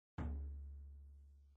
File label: SURDO; Gospel